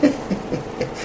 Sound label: mischievous laugh